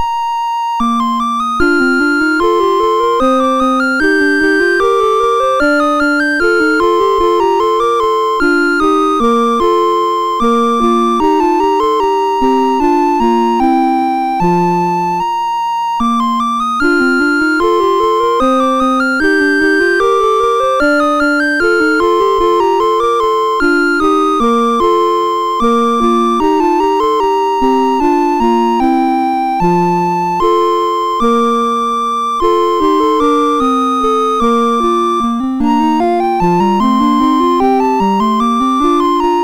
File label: MOS6581; MOS6581R2